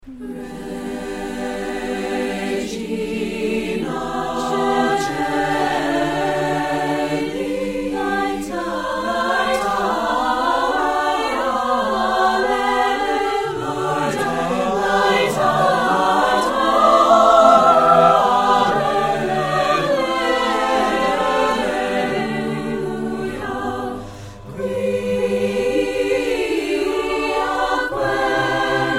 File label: Choir, Regina Coeli; 2003 Deering Spring Concert; (Palestrina)